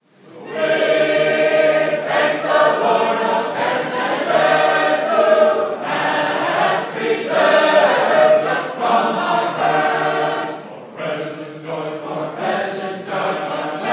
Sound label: choir